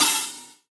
sparkle open hi hat sample f key, drum single hits one shots; Duration 0:01; Samplerate 44100 Hz; Bitdepth 24